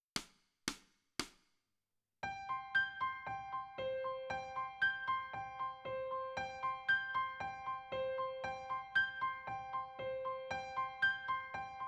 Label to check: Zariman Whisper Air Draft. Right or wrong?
Wrong